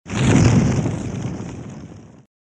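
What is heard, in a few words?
flame; Короткие звуки для монтажа; transition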